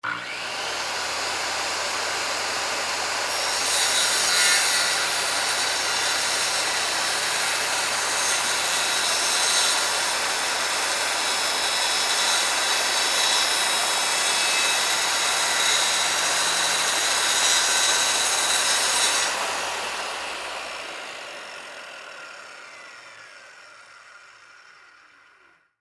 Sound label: Industry, Circular, Saw, Cut, 2X4, Wood, On, Run, Off; Saws